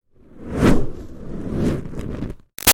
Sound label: Fire Torch Whoosh Fast Burning Tail; BigRoomSound